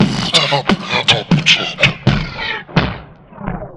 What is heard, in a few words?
Boombox Slow Down